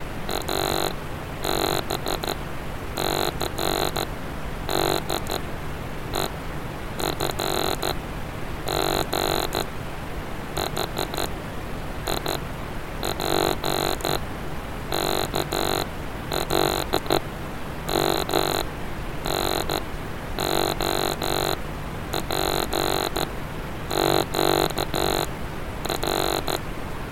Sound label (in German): knallfunken; mittelwellensender beromuenster; AM Sender; radio tv historisch